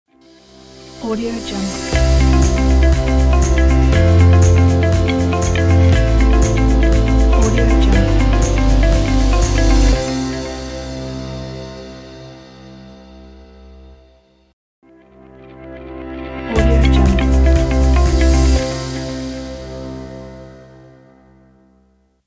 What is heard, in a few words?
a corporate logo